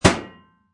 Bullet Impact Metal, Projectile impacts with metal; Bullet hit; Combat